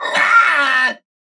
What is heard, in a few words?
Grunt Hit; Alchemist; Paladins